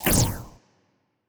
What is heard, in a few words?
fire rate laser muzzle, weapons; Stellaris; biogenesis